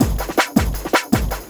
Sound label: Loop, Drum & Bass, 160 BPM